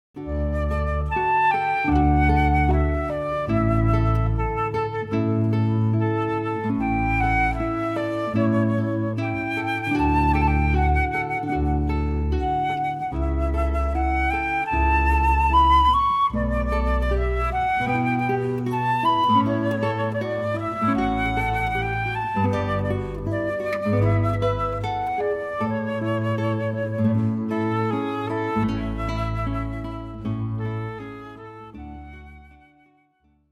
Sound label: guitar flute bass trio; Four Seasons, Winter